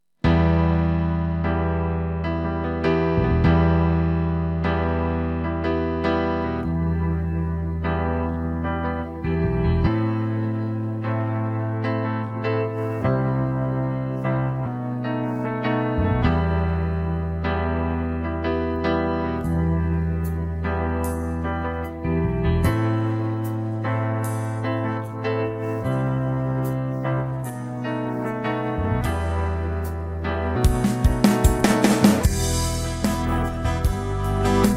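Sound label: música litúrgica no vocals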